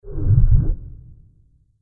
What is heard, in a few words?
Low Woosh Transition; Scary Soundscape; Horror